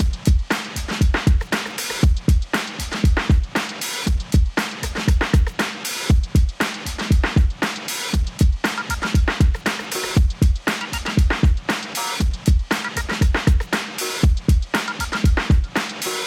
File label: 90 hiphop 118bpm; hot lava; ios macos windows